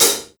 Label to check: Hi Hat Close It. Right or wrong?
Right